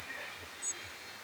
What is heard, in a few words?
Erithacus rubecula; SETE